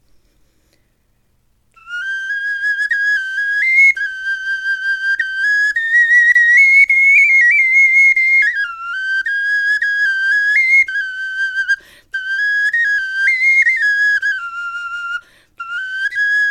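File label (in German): melodie, Knochenflöten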